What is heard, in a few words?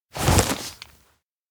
bag open; anemoi